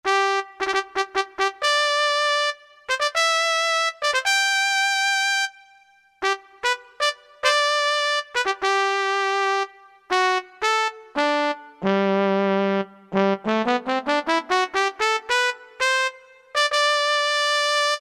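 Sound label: Classical Trumpet, Alesis QS8; ClsclTrmpt; Factory Preset examples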